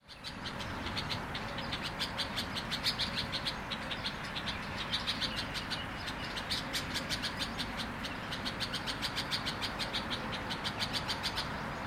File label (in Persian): sedaye bolbol